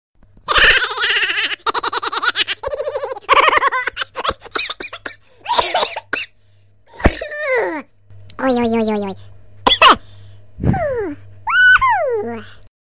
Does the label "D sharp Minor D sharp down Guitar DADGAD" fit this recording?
No